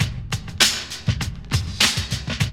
LOOP; E-Lab; X Static Goldmine 2

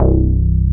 BASS

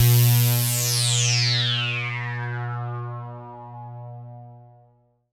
ATMO PADS; Best Service; XX Large

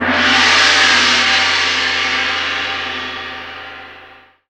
FX GONG; MIX FX; E-Lab; X Static Goldmine 2; akai

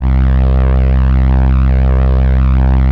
Atmo Pads; Best Service; XX Large

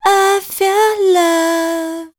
voice spectral, ly short

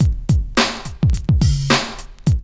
LOOP; E-Lab; X Static Goldmine 2; akai

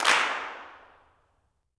CLAPS, Gospel; Spectrasonics; Vocal Planet Vol.1 Gospel; akai

